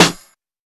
Small Reverb Steel Snare Drum Sound A Key, snare single hi